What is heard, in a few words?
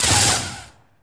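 lightsaber hit high; weapons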